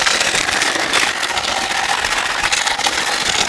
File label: Rock; XBOX; Amped 2